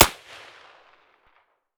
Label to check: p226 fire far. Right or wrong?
Right